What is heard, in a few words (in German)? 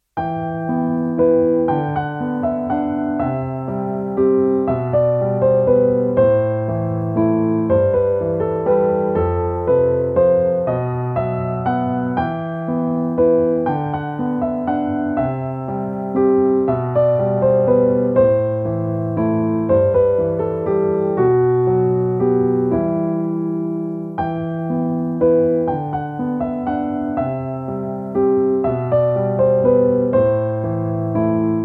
Tango Valse, Endtempo